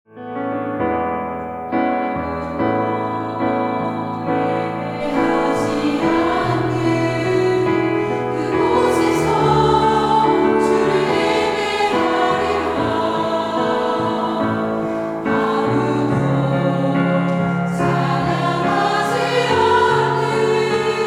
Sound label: noon choir